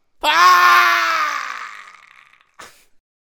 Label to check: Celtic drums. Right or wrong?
Wrong